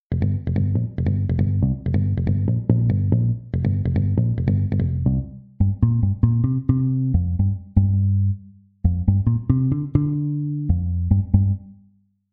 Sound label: hofner amped comp